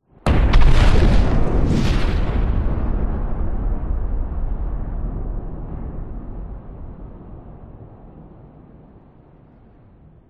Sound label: explosion designed large heavy with shockwave